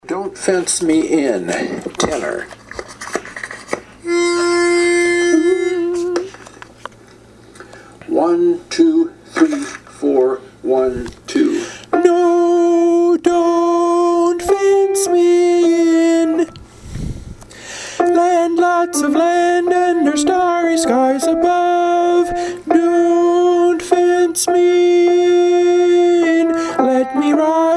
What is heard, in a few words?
Tenor